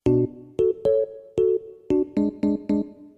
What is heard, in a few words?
wii music sound